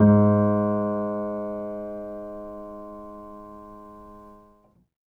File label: Acoustic Piano; InVision Interactive; Miroslav Vitous